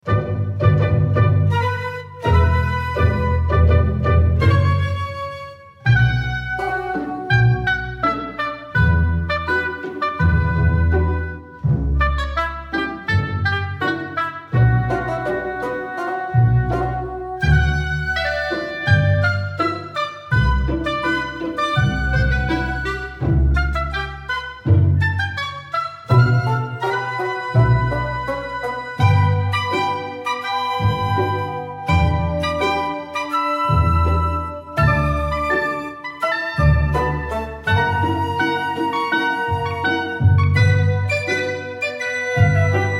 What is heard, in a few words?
Village BPM83 Bm